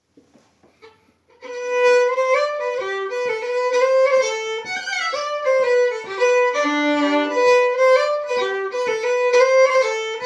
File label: Mazurka; Mazurka efter David Andersson